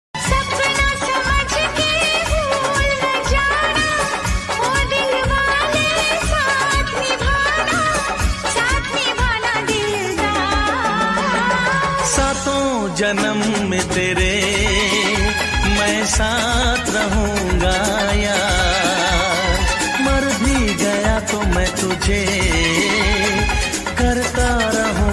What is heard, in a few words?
Old Ringtone